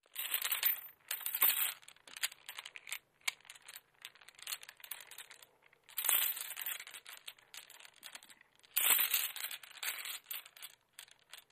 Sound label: Chain Movement; chains